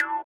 sitar, Indiatek; Spectrasonics; Metamorphosis

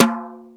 sfx perc

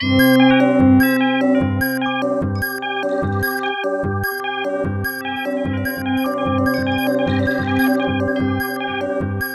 C3, Synth Line; 90 s Sample CDs; NiceBeats; SynthLine VOL-17

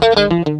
Gtr Riff; Proteus 2000; Digital Sound Factory Proteus 2000

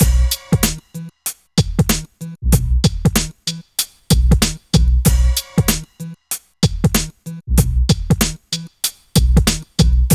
BEAT 95, Beats 93-96bpm; PlatinumPhattCD1; E-MU Producer Series Vol. 8 – Platinum Phatt

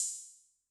Open, HiHat; Yamaha DTXpress-II

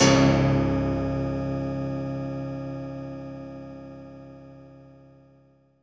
PIANO WIRE, Impacts, Hits & Booms; Distored Reality

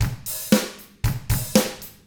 ROCK

